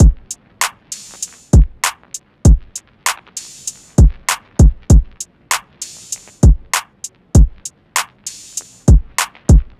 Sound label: Bouncy Trap 98bpm